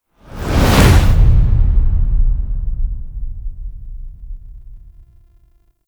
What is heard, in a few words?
Cinematic Tank Finisher; Warframe; Nineteen Ninety Nine; Cinematics